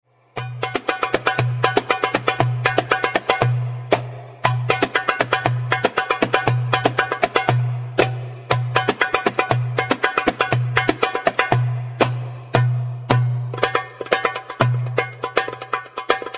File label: tabla training exercise